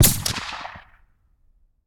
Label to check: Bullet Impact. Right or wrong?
Right